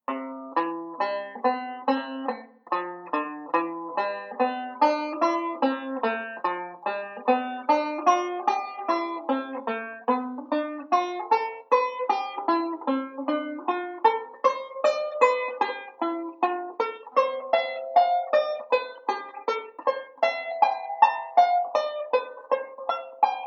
Arpeggio Pattern; Beyond Chord Melody Volume II

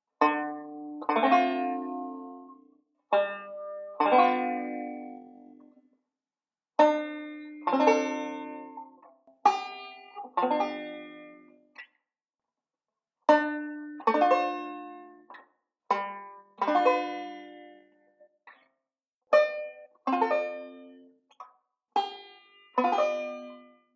ii V Chords, banjo; Beyond Chord Melody Volume II

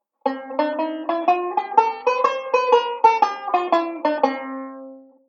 Bebop Scale, banjo; Beyond Chord Melody Volume II

